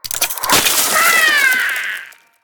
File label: Windup Sapling Treant Attack